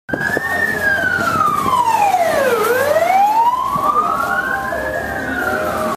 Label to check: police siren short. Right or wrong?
Right